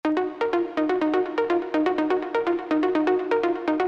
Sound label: Arps